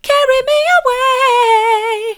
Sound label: carry me away soft, vocal